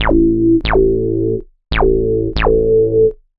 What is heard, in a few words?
ELECTRO BASS 140 A